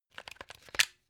plug unplug clatter

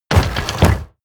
mechanical lever stone medium up

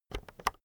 keyboard external enter press slow soft

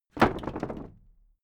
old wood case drop

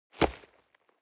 nylon leather hit

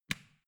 switch small turn on

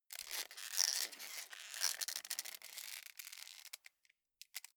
calamus creak short quick

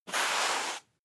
broom sweep hard slow

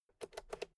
fader slide click quick

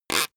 plastic pressured highly short

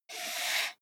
computer mouse draw

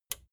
plastic button small latch push

